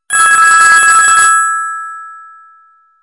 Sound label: Old Ring